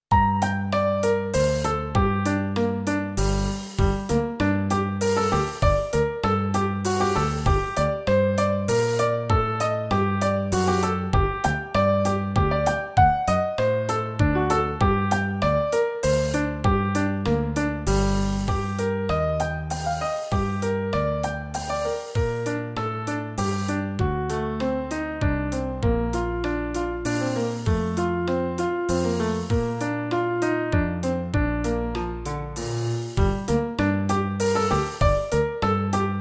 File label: courante